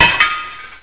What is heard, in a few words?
Brick Break